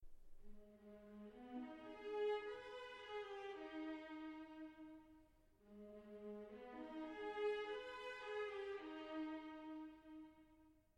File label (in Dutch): altviool